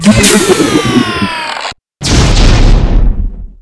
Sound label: sen die, sentien; zaero; monsters